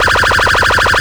Scratch

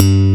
Slap Bass 1 A0

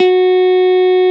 Jazz Guitar Bb2

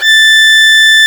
Violin Eb5

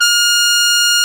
Soft Trumpet A#4

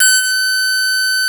Harpsichord Bb4

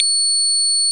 Acoustic Bass C6

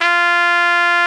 Soft Trumpet A2

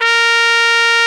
Soft Trumpet D3

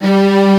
Strings B1

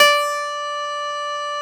Piano Ab2

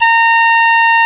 Flute C4

